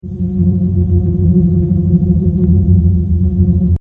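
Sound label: AMB LOOP, Sci Fi Atmospheres; Partners In Rhyme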